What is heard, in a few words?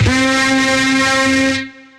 Formant Lead, synth lead; Ableton; Glitch and Wash